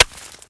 pick ore

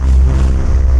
WINGS; mahlendur

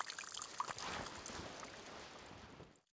PISS END

